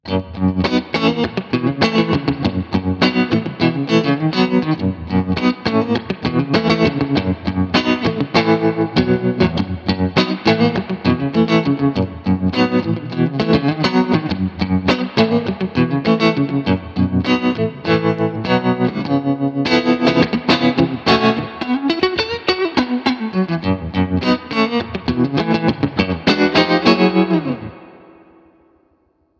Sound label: Tremlord 30 Blues